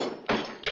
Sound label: bottle on table